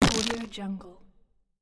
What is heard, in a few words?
scifi organic resource drop